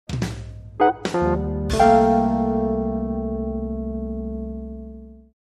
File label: Blues Zone